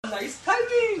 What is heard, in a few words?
yahhhhhh; hanbin chanwoo